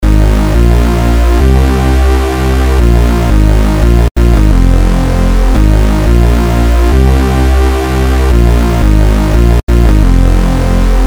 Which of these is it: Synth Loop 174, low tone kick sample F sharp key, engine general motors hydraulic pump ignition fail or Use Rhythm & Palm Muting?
Synth Loop 174